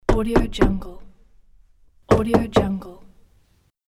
wood door knock

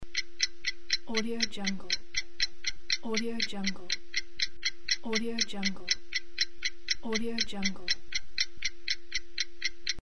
analog clock ticking

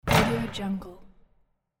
basketball rim shot

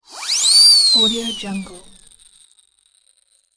mouth lip siren whistle

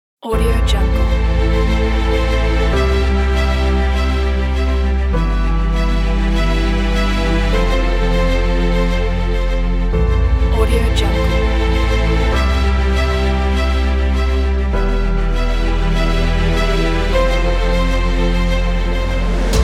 inspiring trailer

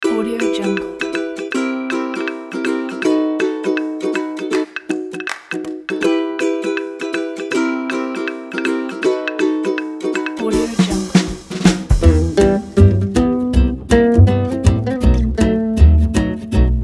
inspirational acoustic swing club

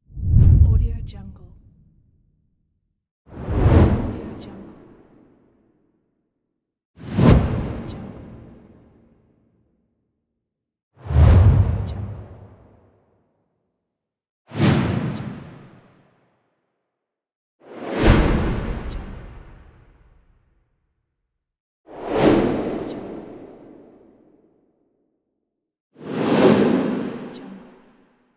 whoosh pack

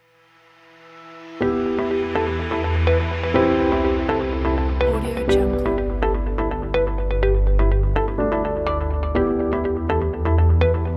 ambient technology

